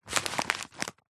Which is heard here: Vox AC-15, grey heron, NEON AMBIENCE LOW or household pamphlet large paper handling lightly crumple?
household pamphlet large paper handling lightly crumple